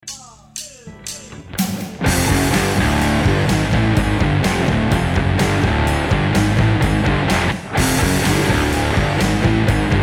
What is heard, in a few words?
D minor jam